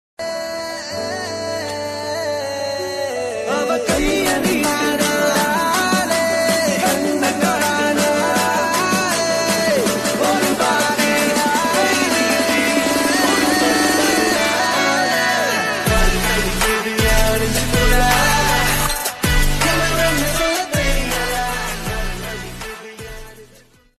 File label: love bgm ringtone; rashi khanna